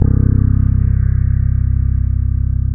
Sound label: BREATH; MORPHEUS